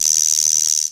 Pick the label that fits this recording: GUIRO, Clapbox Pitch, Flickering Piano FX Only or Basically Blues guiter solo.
GUIRO